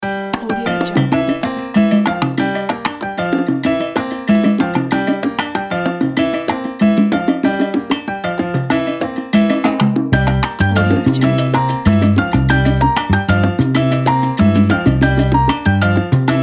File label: salsa cubana tumbao